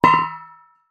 industrial metal post driver put down on concrete ground; zapsplat